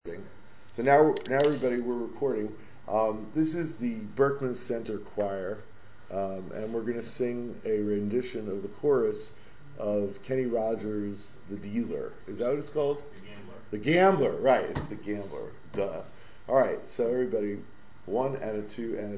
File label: Choir